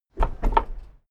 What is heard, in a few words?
car lift down short heavy